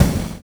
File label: Dose Kick; Sonic Foundry (Sony Creative Software); Drums & Drones; Construction Kits